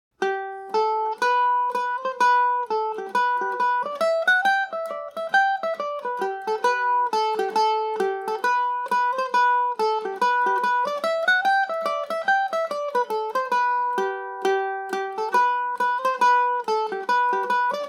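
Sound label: G major, Irish mandolin; G&O #34 mandolin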